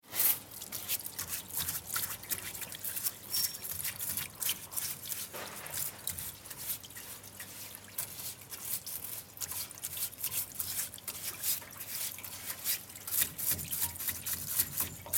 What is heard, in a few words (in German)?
Kaltreinigertisch